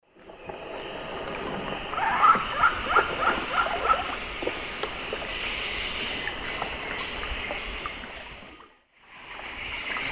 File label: Celeus elegans, call; S. America; Eastern Lowlands